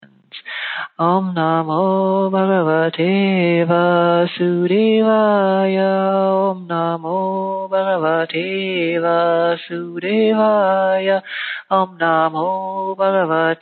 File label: bagavate chant